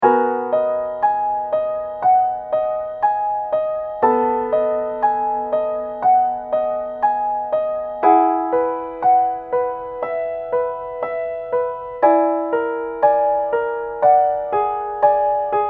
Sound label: piano